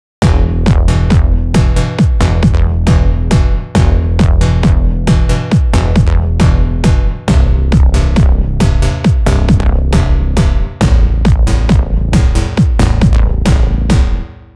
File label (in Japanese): ベースの打ち込み